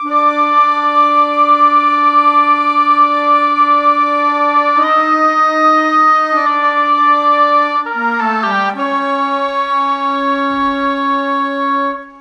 Clarinet, Flute Oboe; Cinematic 01; Sonic Foundry (Sony Creative Software); Orchestral Series VOL-3 - Cinematic

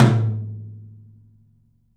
open tom; 3D Sound; 3D Drums; 12 TOM

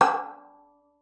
FING E6, VIOLIN; Xsample VOL-5

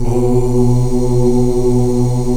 afro ooo, choir oos; Spectrasonics; Heart of Africa 1

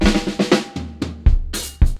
dope fill, Breaks

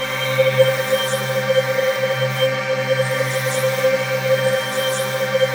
ATM TONAL; Best Service - XXL Production Effects

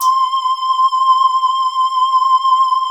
LEADS; Masterbits; Vintage Voltage

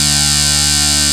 RAVE BEEP; Best Service Alpha Dance vol.2

